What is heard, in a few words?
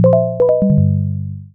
Low Battery; Emberfall